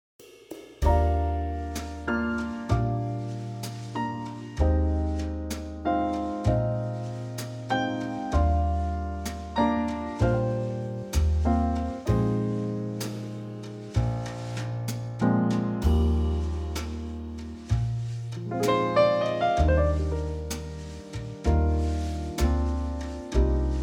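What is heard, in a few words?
jazz ballad Eb, backing track; Trio; key - Eb - vocal range - F to Bb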